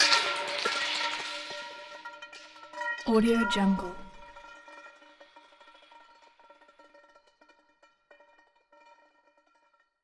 matter mayhem do metal post cable roll away; soundmorph

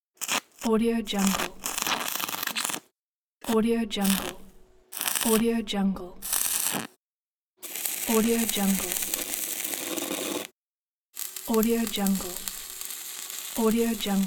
welding; dragospitaru

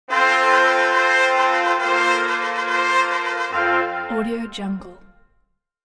fanfare trumpets for royal occasions

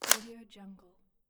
instant camera lens settings

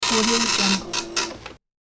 dot matrix printer; hollywoodedge

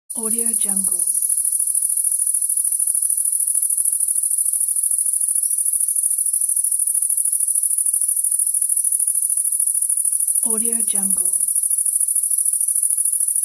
grasshopper

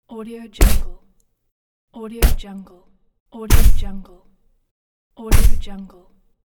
poof

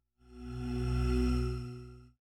pulsing tone smooth mid range synth tone

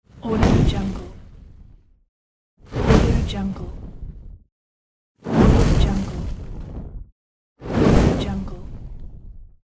flame; audio-therapy

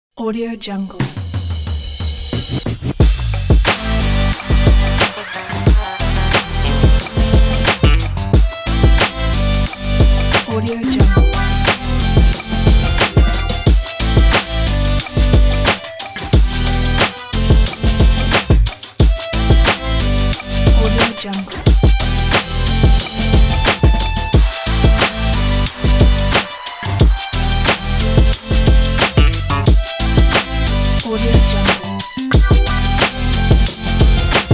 boom bap time; wowformatbeyondthelimits

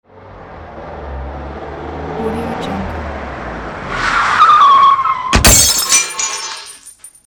car crash

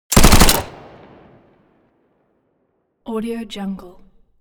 weapons smg uzi 45 modern burst

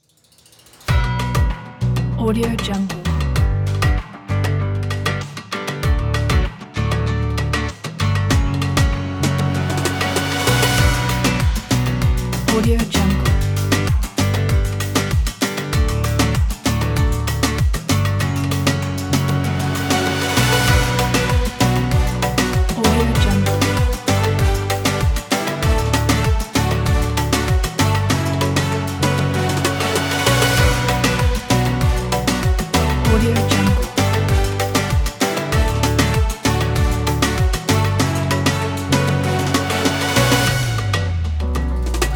emotional latin dance pop

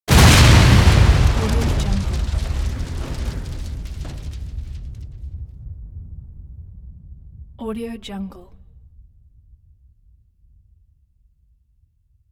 explosion

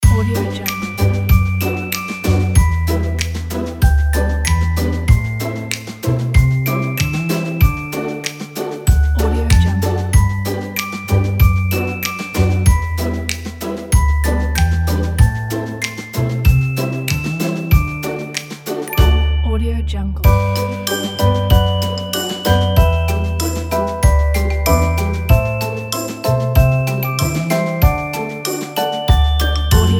happy music box

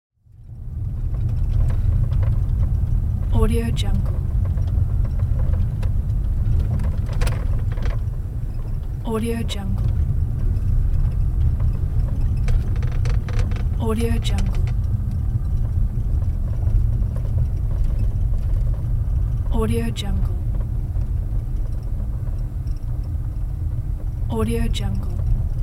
car passenger ambience

